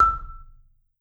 marimba soft E5, Marimba

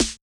Yamaha DJX 2B, SD Analog L

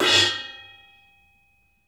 RIDE CHOKE, RIDES; Sampleheads; New York City Drumworks VOL-1; SP RIDES

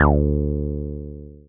Synths BASS

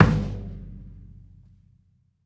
Snare, Gong, Kick; Essential Percussion

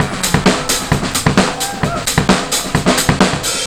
In Concert 131 bpm, breaks; Zero-G Beneath the Planet of the Breaks

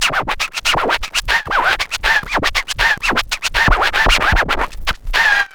scratch tools; SCRACTH; Megabass Remix! 2

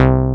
BASS, SYN BS

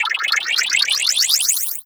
Bandpass riser

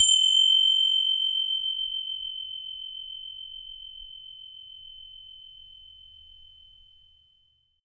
Indian Bell Hit Loop, Ethnic Instruments; Sonic Foundry (Sony Creative Software); The Electronic Music Manuscript Collection

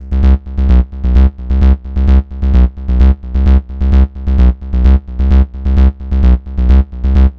NRG Bass, Trance

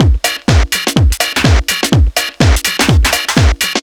footpump, Deep House 125bpm

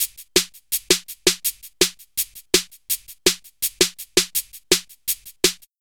165 light box, Drum Loops; Drum & Bass; Total Drum&Bass

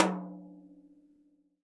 TD-20 Tom A, Roland TD-20 Toms

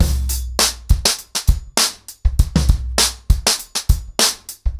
100BPM, ACOUSTIC DRUMS

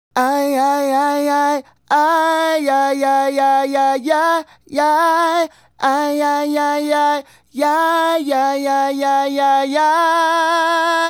Male Solo, Hip-Hop and RnB Vocals

